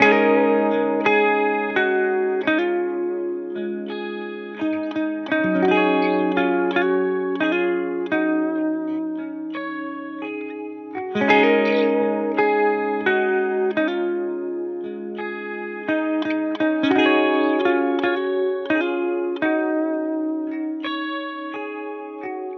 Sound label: guitar loop 85 Db; Pop; Guitar Stacks; SD PASADENA Pop Sample Pack; Slate